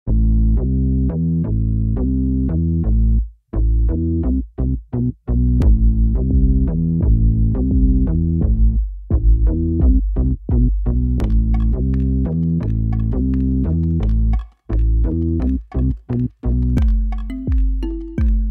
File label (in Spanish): Cumbia sin fronteras; Exhibition